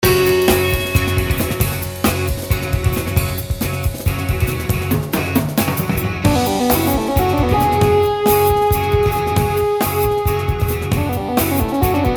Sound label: keyboard solo